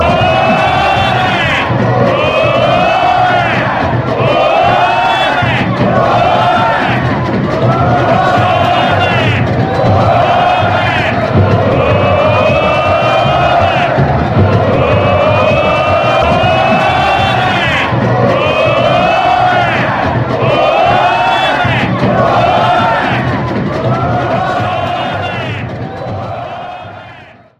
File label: ooooooole, chant